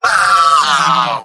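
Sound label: engineer pain critical death; tf2; mvm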